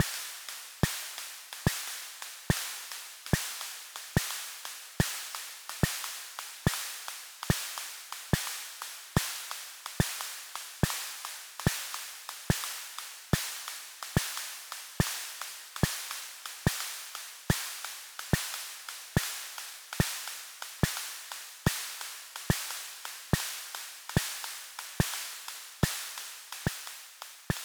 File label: D72 Rhythm